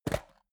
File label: metal box small open; maschinen; soundpool